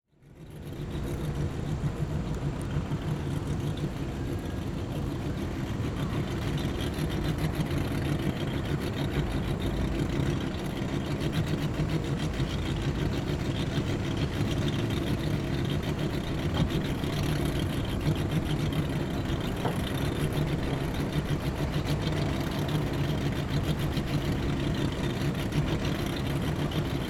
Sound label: Transportation Boat Idle Long